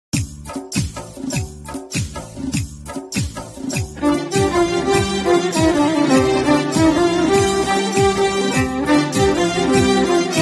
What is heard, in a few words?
Violin